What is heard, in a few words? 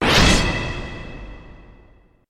Iron Talon Chop